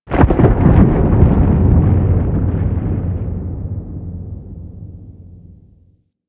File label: Moon Earth Crack Large, Ambience; Warframe; Orokin